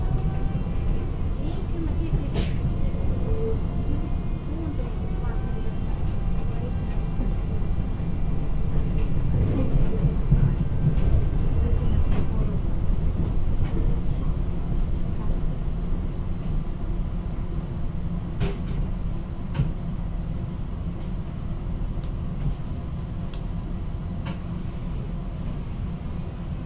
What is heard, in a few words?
Helsinki tram